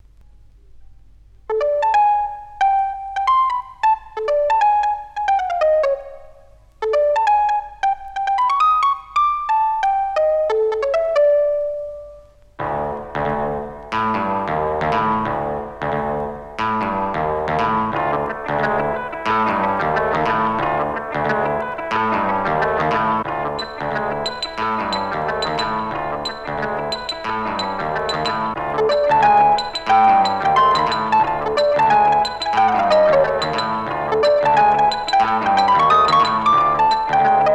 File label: electro beat